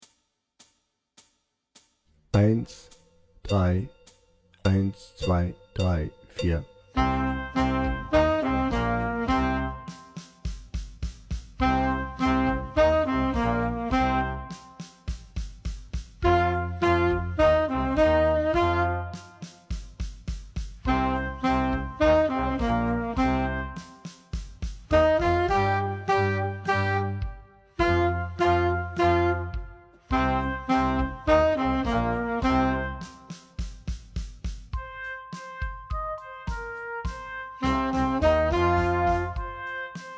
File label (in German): Hard Rock Blues Tenorsaxophone lauter langsamer